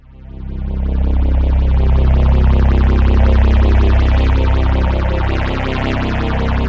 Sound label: PHASE PAD, ATMO PADS; Best Service; XX Large